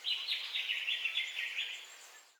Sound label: bird call; children of morta